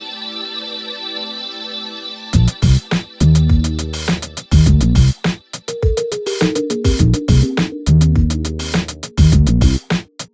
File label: electrofunk